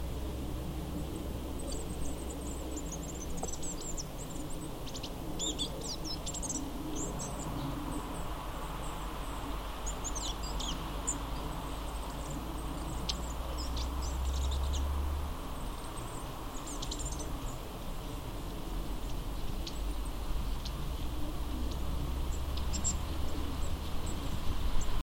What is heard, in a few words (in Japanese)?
enaga song; akasaka